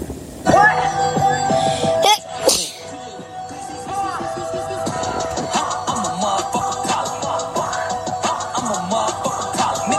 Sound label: song